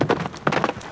horse move; Gamestar

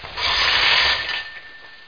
winch down; amigamud

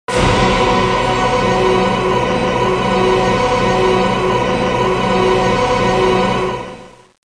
oooh; pioneer

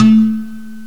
kalimba, ethnic; gmplay

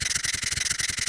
Spider Walk

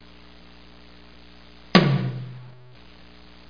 drum; Broderbund Print Shop Multimedia Organizer Deluxe Edition

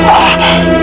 hurt; doom ash

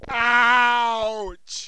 punched; madman; Hellboy

